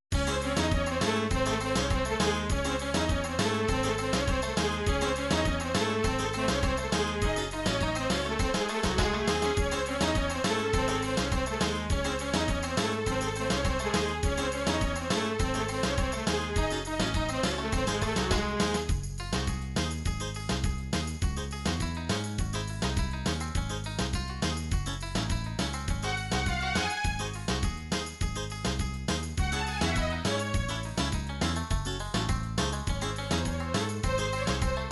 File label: folk turkish kiuchek, midi